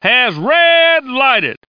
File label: STAGE; Millennium Gold 2000; Monster